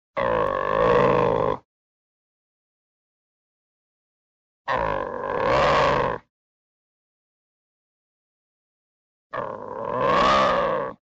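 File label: low roar, angry growl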